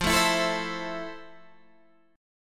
F Major 7th F, Guitar, DADGAD

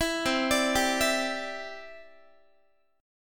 C-Major Add 9th, Guitar; Cadd9 arpeggiated

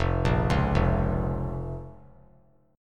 F Suspended 4th Sharp 5th F; arpeggiated; JGuitar

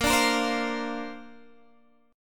A sharp Major Add 9th, Guitar, DADGAD

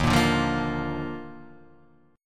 D sharp Major 7th Suspended 2nd; strummed; JGuitar

